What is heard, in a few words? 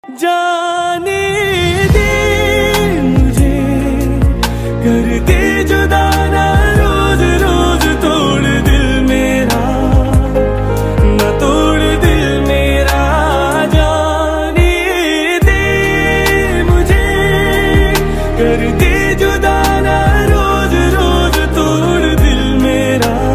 song ringtone